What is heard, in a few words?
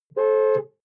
sports car int horn single; verkehr-transport